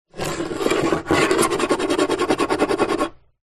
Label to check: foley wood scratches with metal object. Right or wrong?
Right